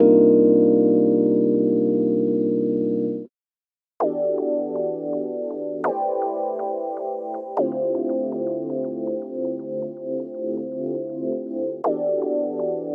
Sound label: Sampler